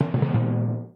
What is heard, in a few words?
eyecatch